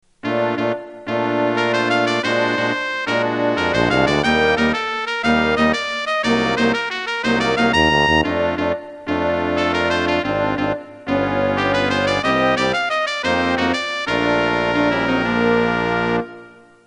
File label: opening brass